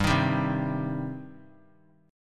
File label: G Suspended 4th Sharp 5th G, down; Gsus4#5 strummed; JGuitar